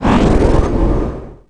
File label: Bonus; stardunk ios gamerip